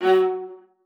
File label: MART F#3, VIOLA SPICC; Xsample VOL-5; 90 s Sample CDs